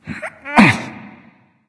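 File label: nonverbal grunt lift; smite; voicepack; ullr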